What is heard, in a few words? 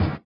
SWUSH; Spectrasonics; Metamorphosis